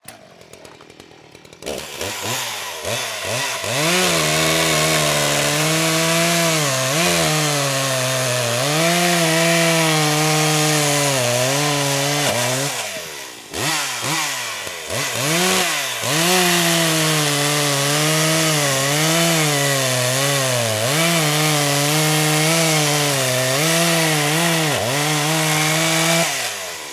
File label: chainsaw; mechanical